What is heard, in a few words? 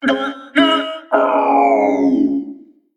Mech Emote Death